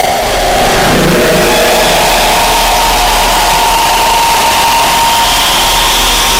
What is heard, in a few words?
ultimate scream